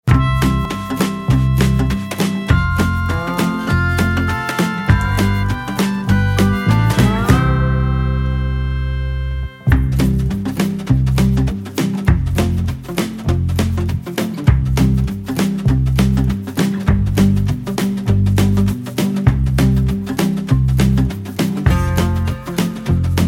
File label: contemporary instrumental